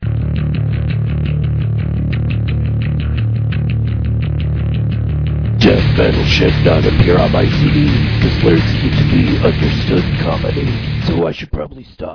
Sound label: Death Metal